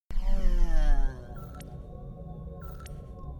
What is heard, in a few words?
aaaah